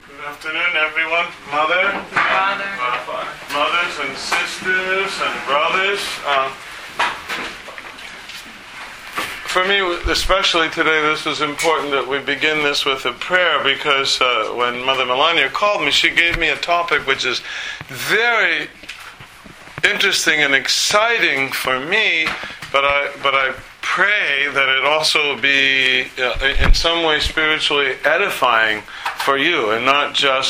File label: Bell Tolls